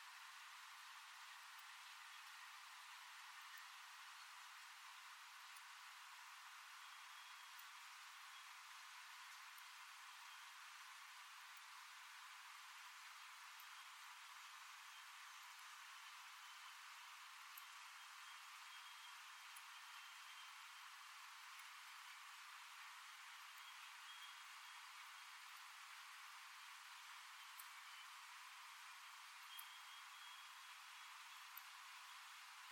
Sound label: hydrophone audio sonar; JASCO AMAR HYDROPHONE; filtered; beamreach